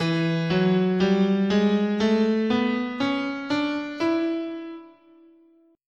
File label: E Bebop Minor, guitar, scale